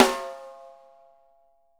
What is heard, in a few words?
wood; Sampleheads; Peter Erskine Living Drums